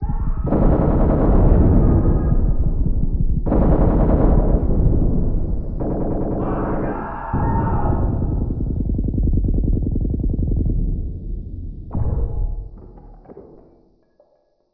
distant fighting

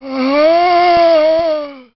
zombie attack, male

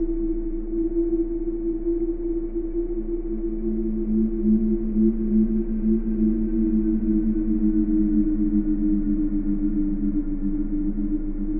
wind

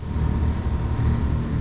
plasmo charging

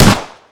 shoot; mg1

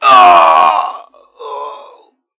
death; doctor

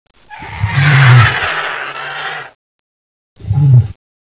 pain, leviathan

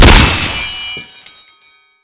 fire; hassault

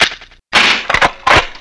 devastator reload

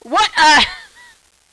alert

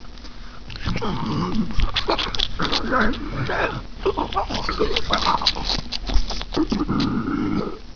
eat, superzombie

